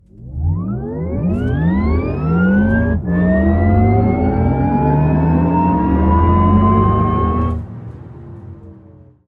porsche taycan elektroauto sound

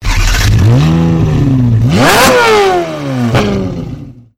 ferrari 458 italia, laute sportwagen sounds

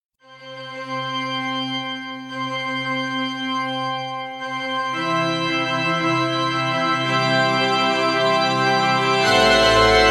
klingelton hochzeitsmarsch; zum heiraten

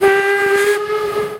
Dampflokomotive Dampflok pfeifen sound

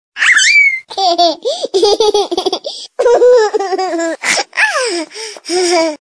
motorsaege geraeusch baby

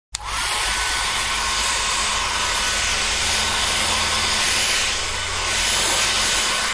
haushaltsgeraet foen; elektrogeraet

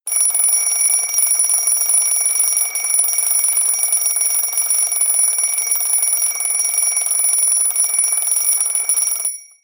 wecker klingelton von klassisch bis sanft

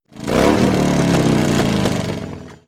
Harley Davidson Motorrad Sound

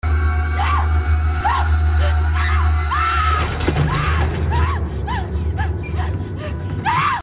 creep schrei

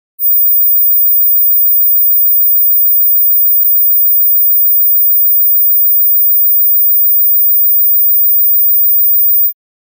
nokia klingelton in hoher frequenz 17khz

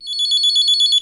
Motorola LX4 Melder Klingelton

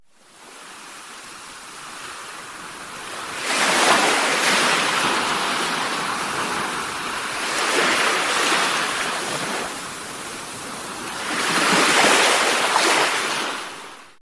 Meeresrauschen Meer Wellen rauschen Sound

